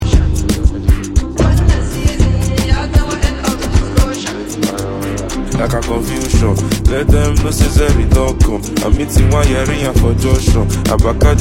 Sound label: Hausa piano Remix